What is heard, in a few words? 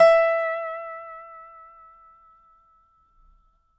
DOBSUSE5 -R, dobro notes; Country